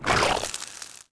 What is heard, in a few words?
meat Hit; weapons